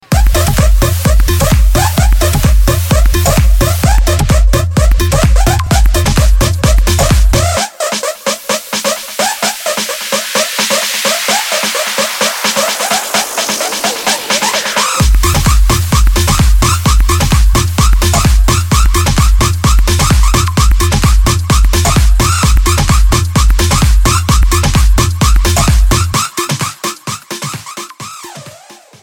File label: ringtone